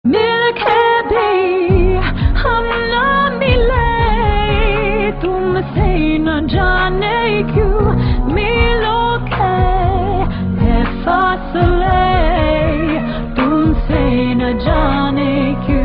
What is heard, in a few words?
english version tone ringtone, bollywood